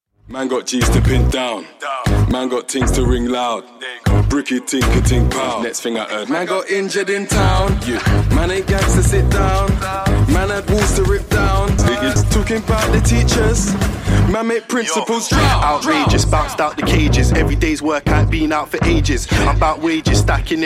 drumline

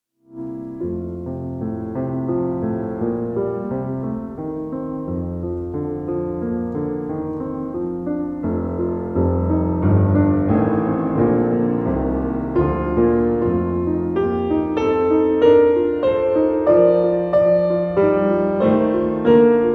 piano, andante cantabile in b b maj; clavierstucke; 2 musical sketches for piano no 1

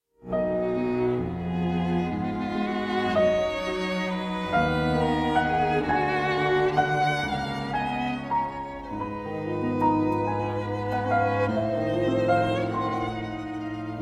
piano quartet no 2 in G minor; britten sinfonia; op 45

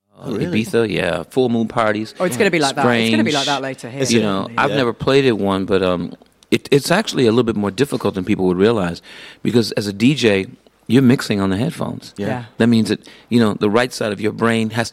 beatbox

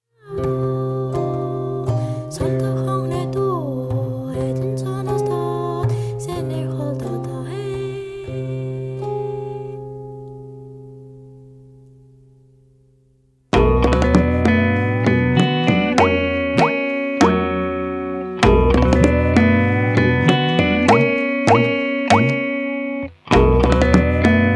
xylophone; asa chang

